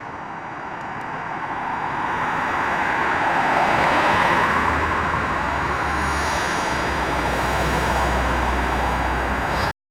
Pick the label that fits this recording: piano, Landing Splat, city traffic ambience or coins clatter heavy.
city traffic ambience